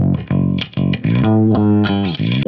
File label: Bass, Wah; Bass X